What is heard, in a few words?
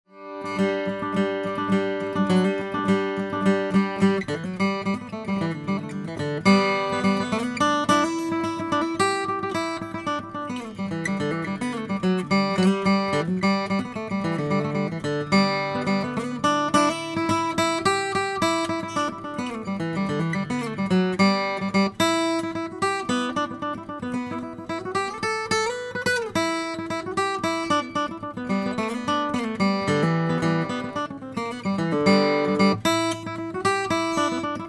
dudenbostel 000 brazilian rosewood